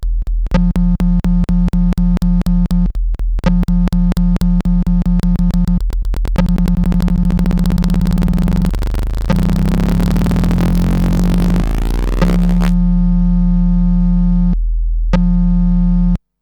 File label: Voicerec with sine thru NC of relay; eurorack